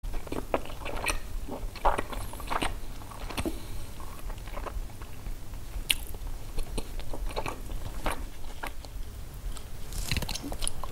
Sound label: fruit roll